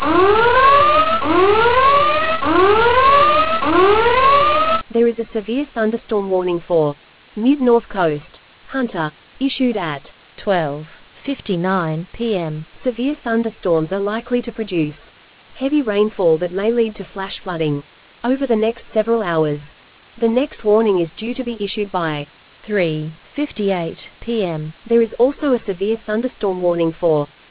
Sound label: storm audio